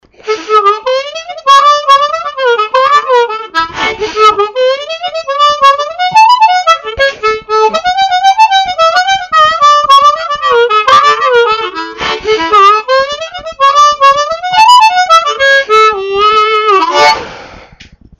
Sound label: harp